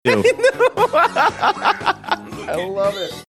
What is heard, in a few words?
laughing saying i know i love it